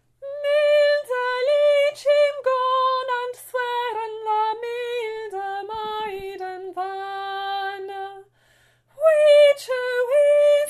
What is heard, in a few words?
soprano; 2nd verse